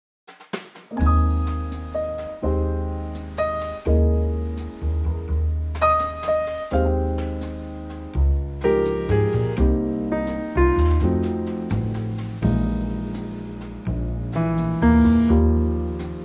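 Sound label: Ab Trio, Backing Tracks for Male Vocals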